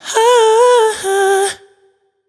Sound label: riff flips Em wet, Vocal; Slate Digital Omnivox Vocal Sample Pack